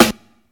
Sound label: 00s hip hop acoustic snare a key, Royality free snare drum tuned to the A note